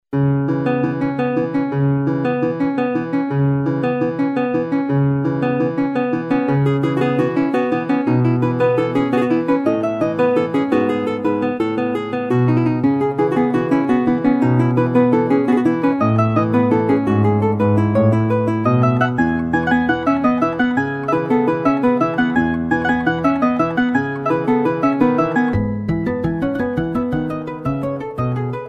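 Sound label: classical guitar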